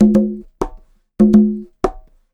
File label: Conga Loop (100BPM); musicradar